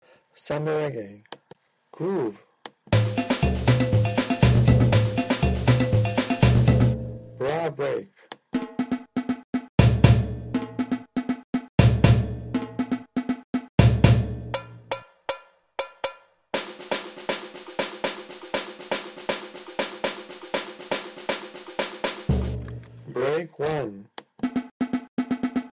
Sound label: Samba Reggae